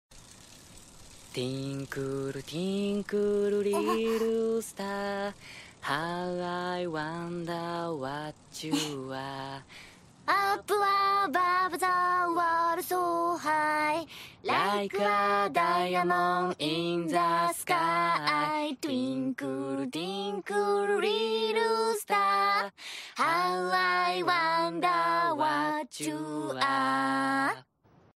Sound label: Twinkle little star